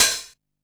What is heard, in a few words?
Hat, Drum & Bass; AMG - Prototype Drum & Bass; Hats & Rides